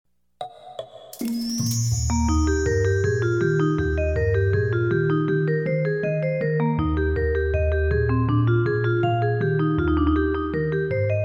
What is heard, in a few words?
Flute Sonata in Eb, 1st Movement; Flute Sonata in Eb, BWV 1031